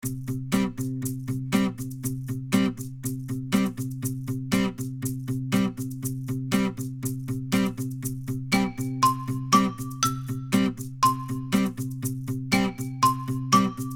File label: happy; アコースティックギターと鉄琴・木琴でハッピーな雰囲気の楽曲